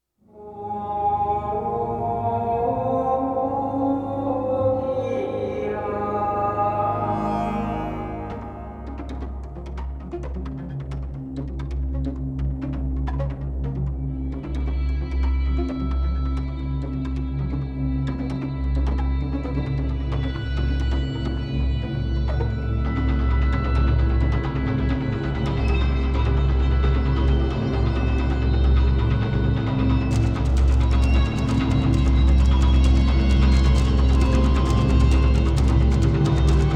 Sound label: Medieval Spiders horror